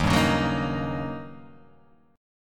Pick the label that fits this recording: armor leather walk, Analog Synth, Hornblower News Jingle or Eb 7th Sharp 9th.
Eb 7th Sharp 9th